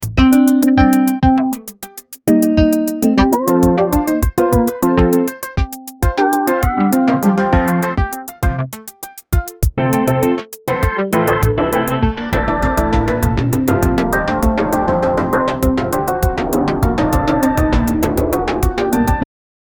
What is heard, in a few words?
simple time beat of, 115 bpm